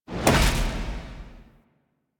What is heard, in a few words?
Fly Inner Depths Detach Land D; Sentient Devourer; Sentient Spawn Outdoors